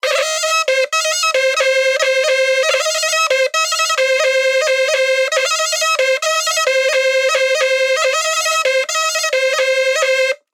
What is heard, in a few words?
Pepa BPM 90; Gully Loops; waves of bihu vol 1